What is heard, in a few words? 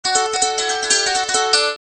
Kantele